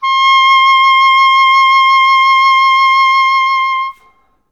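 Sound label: vibrato C5; Best Service; Peter Siedlaczek's Advanced Orchestra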